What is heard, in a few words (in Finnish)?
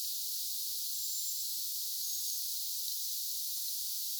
tuollainen pyyn sae